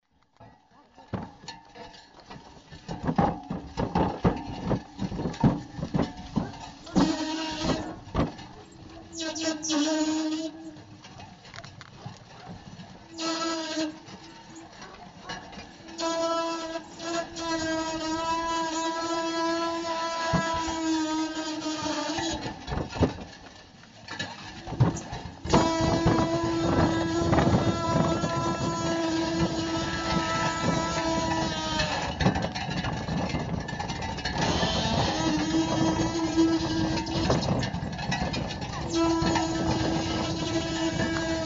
carro bois

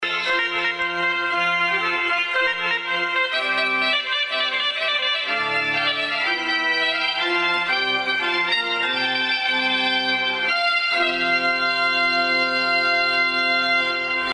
musica orgao

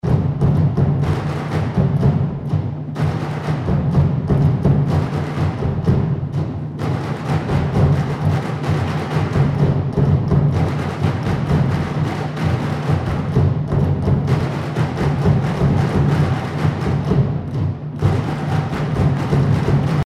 percussão

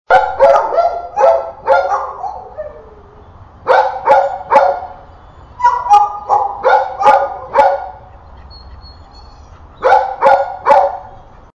ladrar perto

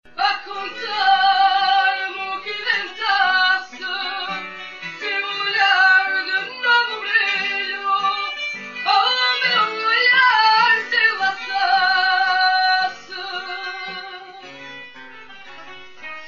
musica

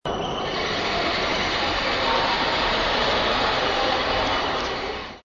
barulho agudo

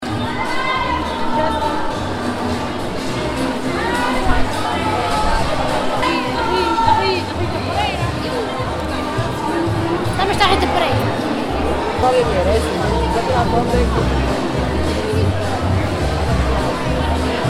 som ambiente

